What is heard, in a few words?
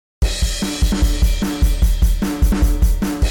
drum